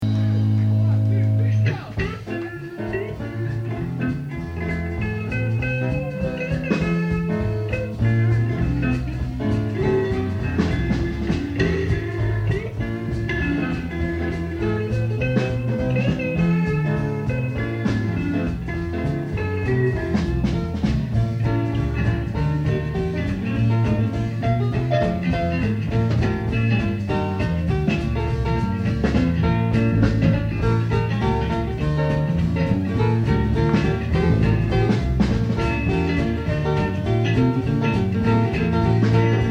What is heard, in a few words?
Swing Low